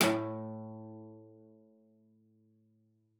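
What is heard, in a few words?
CELLO spiccato A2; 90 s Sample CDs; Xsample VOL-5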